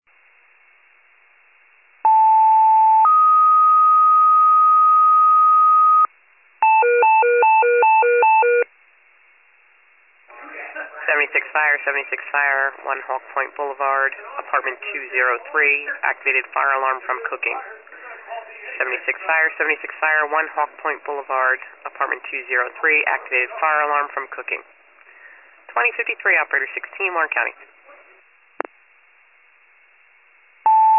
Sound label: fire, pager alert